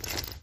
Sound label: step